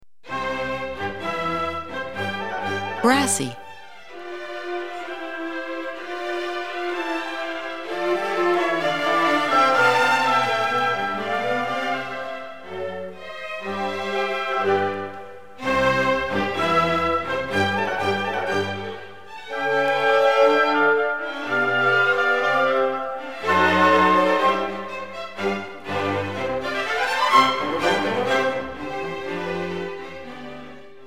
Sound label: brassy